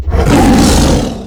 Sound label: Dragon Roar Close